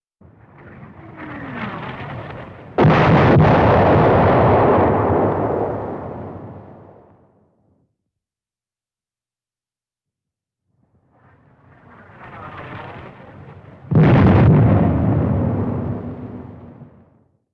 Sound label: Shell - 105 mm howitzer, approaches & explodes, 2 times